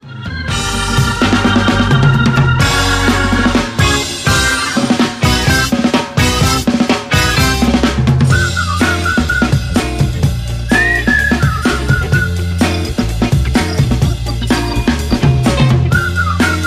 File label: Whistle Song